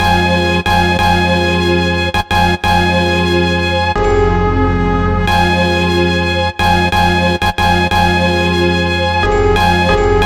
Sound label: Sample Melody; Cinematic Funk; Sonic Foundry (Sony Creative Software); Cinematic Funk Urban Tone Poems